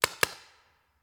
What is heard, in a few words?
Paintball Gun; Sound Ideas; Guns